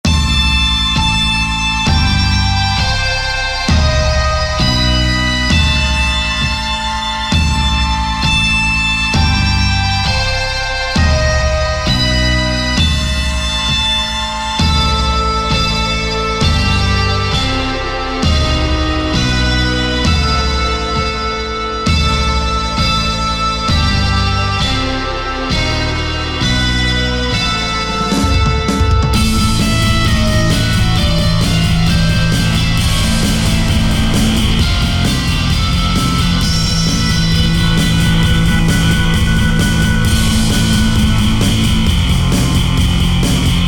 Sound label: Metal version